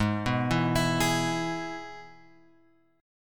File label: G sharp Minor Sharp 5th; G#m#5 Chord {4 2 2 X 5 4} arpeggiated